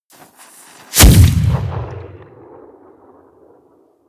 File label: cannon steel close stone